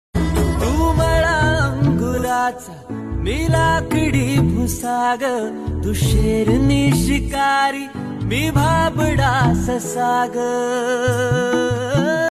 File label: marathi love song